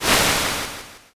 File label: swoosh